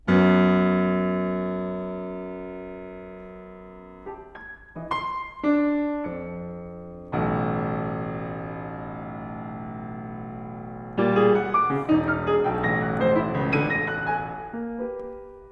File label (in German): Klavierstück; Klavierstück I Version A Take 1 Crux I in context